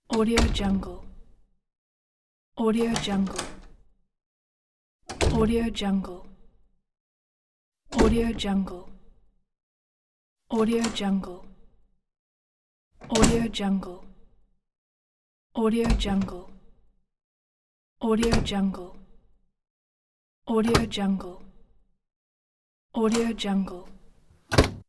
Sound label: door opening and closing sounds; soundall